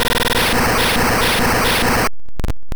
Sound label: Fire Spin; sfx; attack moves; pokemon; gen 2; gsc